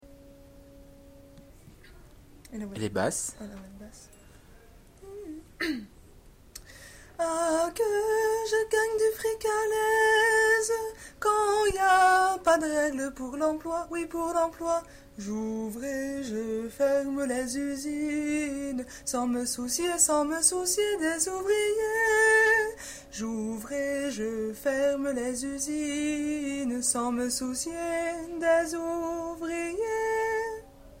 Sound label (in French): basse